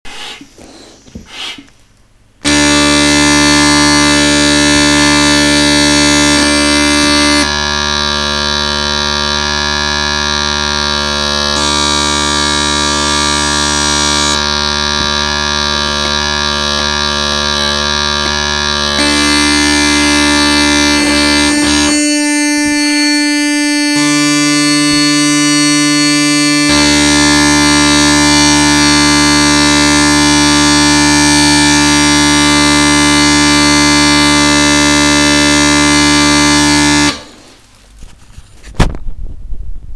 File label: D drones